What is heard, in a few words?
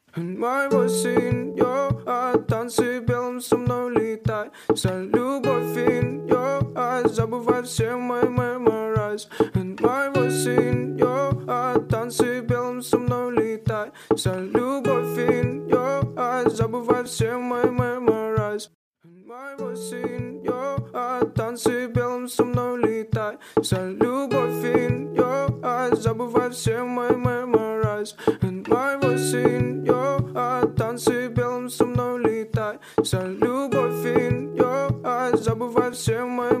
acoustic remix